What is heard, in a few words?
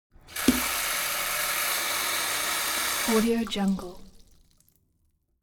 water sink fill splatter hard short; smartsoundfx